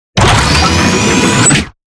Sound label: Robotic Movement; SoundsCrate